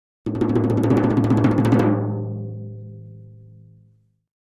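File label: Drums Toms B Roll Crescendo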